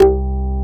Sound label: Korg CX3, C 1